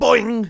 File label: twirl bounce; foreman spike; SM64 CO-OP